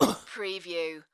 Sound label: Pain Umph, Male Painful Grunt, Hurt